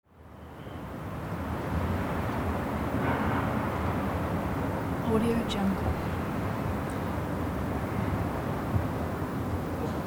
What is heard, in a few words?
early spring residential area evening ambient